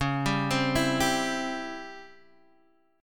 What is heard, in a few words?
C sharp Minor Major 7th Flat 5th C sharp Guitar Standard, chord; arpeggiated; x,4,5,5,5,3